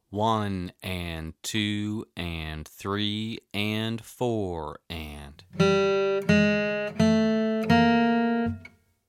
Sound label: Chromatic Scale